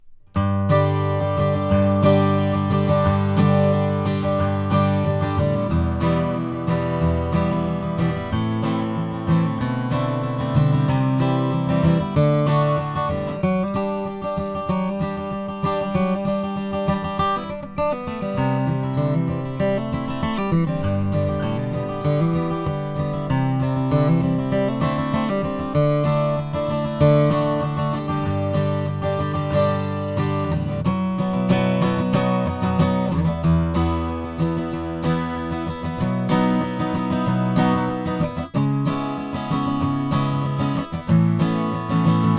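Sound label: rainsong dr1100n2, guitars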